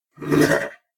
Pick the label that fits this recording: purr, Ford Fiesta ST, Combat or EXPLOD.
Combat